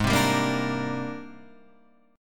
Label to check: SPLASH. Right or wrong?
Wrong